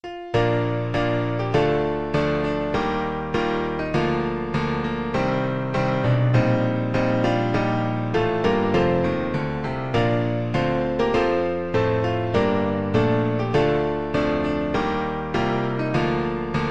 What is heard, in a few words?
national anthem, piano